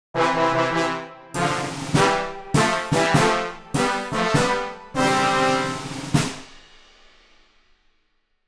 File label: You win success victory fanfares; cartoon ascend